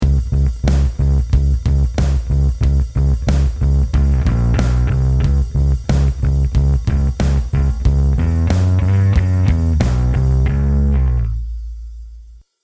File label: heavy